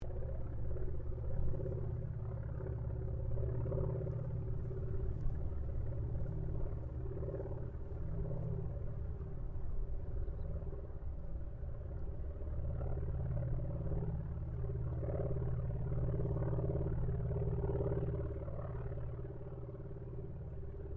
AIRCRAFT CAC WIRRAWAY PASS DISTANT STEREO; CA-16 Wirraway; Airplanes; Vehicles

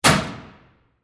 Locker Close, Sports, Locker, Door, Close; Miscellaneous Sports (Sound Effects:Sports)

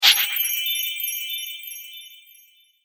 Coin Magic, magic sound effects

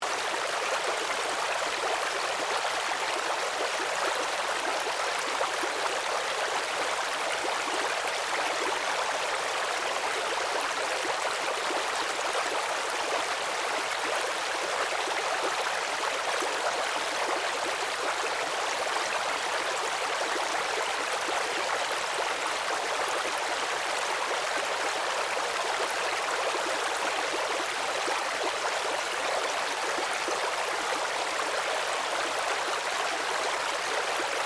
Ambience, Creek, Babbling, Brook, Large, Loop; River Sound Effects

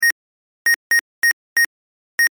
Household Security System Enter Code Keypad Beeps; Miscellaneous Household

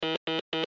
Morse Code s Letter; Morse Code Sound Effects

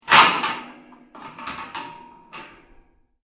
METAL PLATES MOVE; Metal (Sound Effects:Materials)

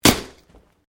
Branches Dead Snap

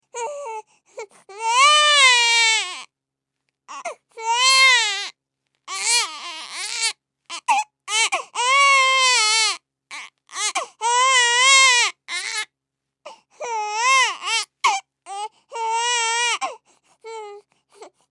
Human Baby Crying Long; Babies; Voice

